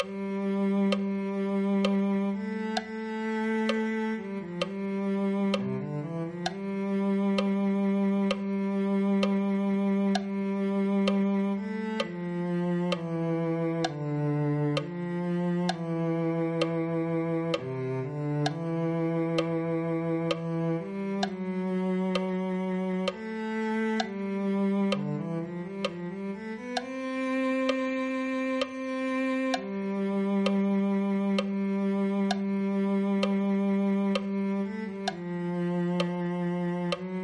cello

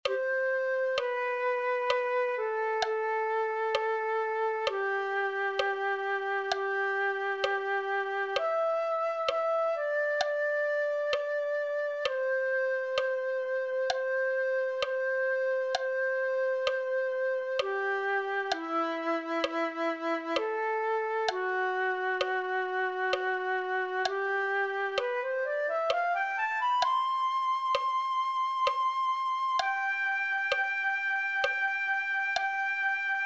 flute 1

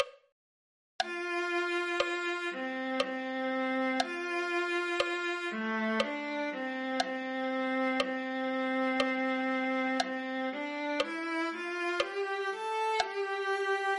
viola

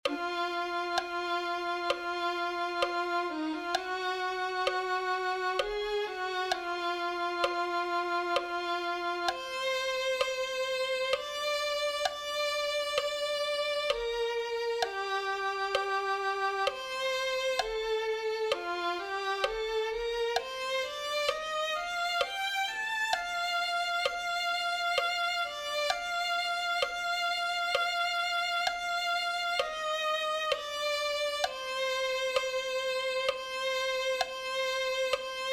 violin 2

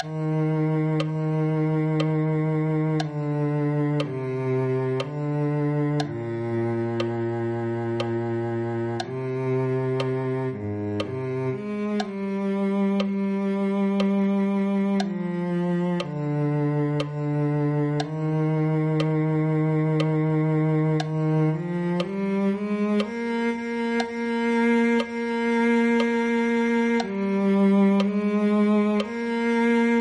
cello

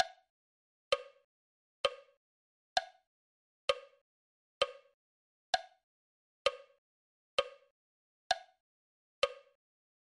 cello

